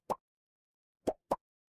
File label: cartoon pop